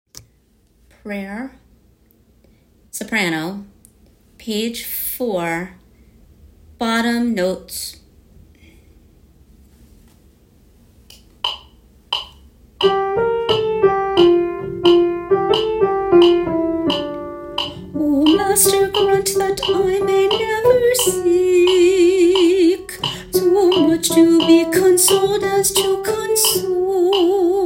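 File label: Prayer Soprano 2; Broadway Shabbat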